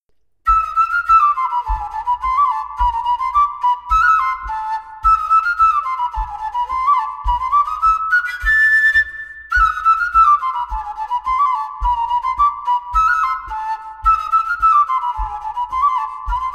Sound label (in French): Bourree variation; Croisez